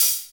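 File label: hi-hat, hi-hat real; Sound & Vision - Gigapack I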